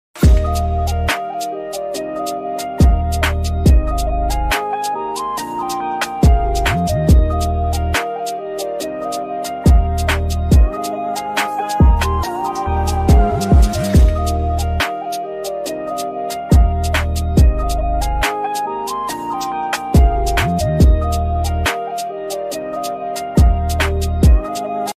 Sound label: bgm ringtone song